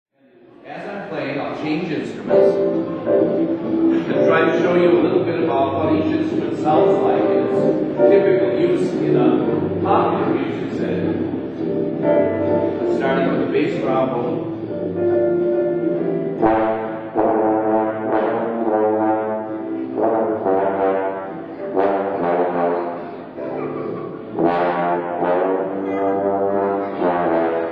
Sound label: Trombone